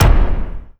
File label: tick; ui